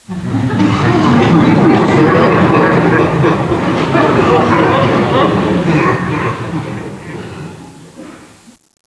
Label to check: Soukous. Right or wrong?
Wrong